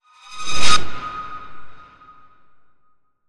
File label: Flyby; Eccentric Encounter